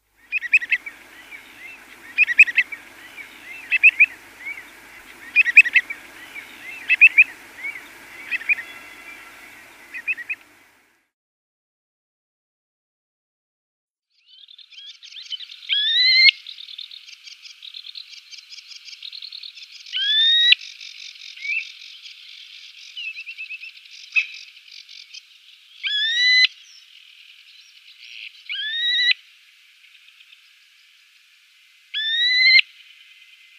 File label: Terek Sandpiper calls